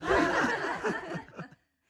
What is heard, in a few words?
studio audience laugh; soundscrate